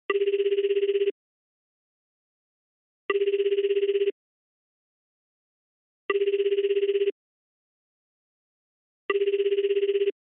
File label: Telephone Signal Tone Ringback